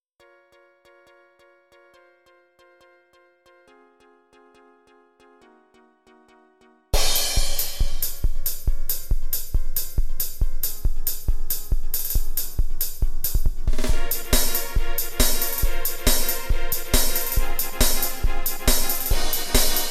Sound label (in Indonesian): Nada Dering Nokia Jadul; Hummingbird